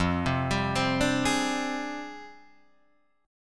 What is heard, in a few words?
F Minor 6th Add 9th Guitar Drop D; down; chord shape